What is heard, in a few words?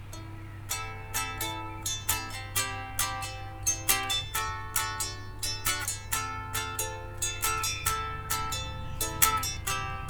house mic, ukulele